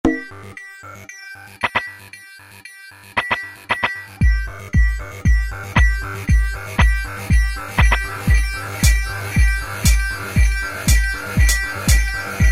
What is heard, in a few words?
Atm Rave Gruv, E-mu Morpheus; Factory Presets